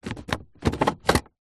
vehicles car gear stick automatic movement set gear toyota rav4